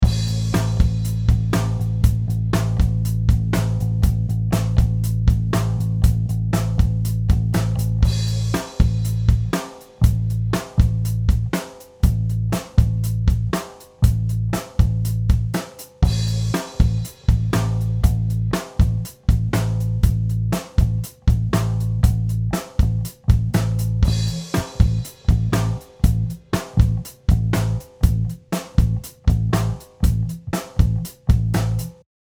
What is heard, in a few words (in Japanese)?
ベース リズムパターン 裏